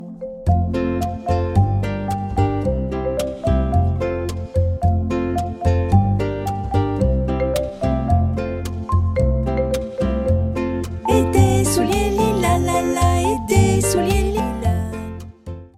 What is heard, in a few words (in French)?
Mon ane, version instrumentale